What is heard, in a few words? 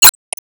Bumper